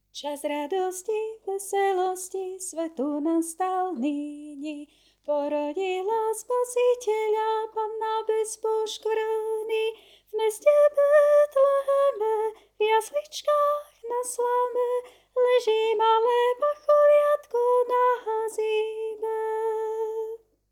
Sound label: Tenor